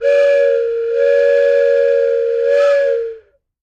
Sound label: Toy Train Whistle: Double Pitch, From Close Perspective.